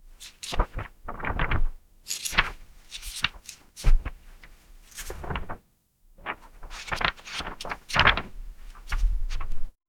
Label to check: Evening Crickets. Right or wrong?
Wrong